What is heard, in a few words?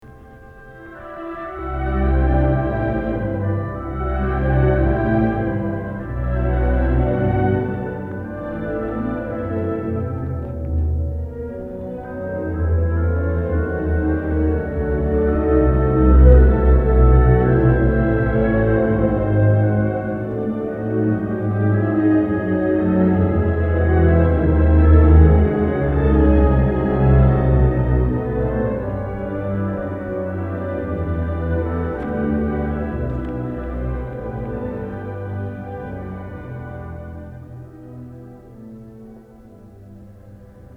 Street Orchestra